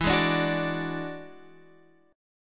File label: E 9th E down Guitar Open F; E9 strummed; JGuitar